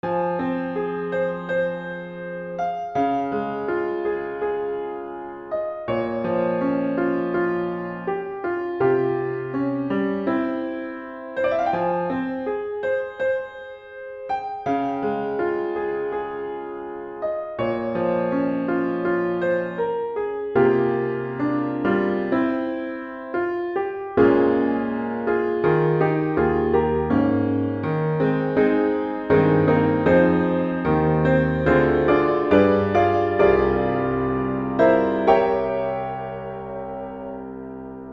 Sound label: Piano